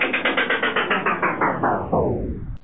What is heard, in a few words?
machine stop